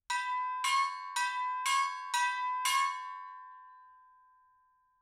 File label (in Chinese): 二馨 演奏技巧 连打